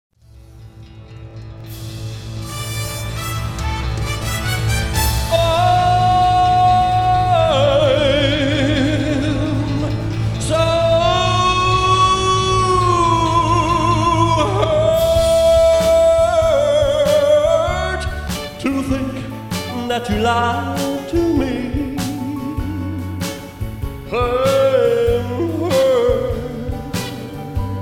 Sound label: Impersonator; Elvis Impersonator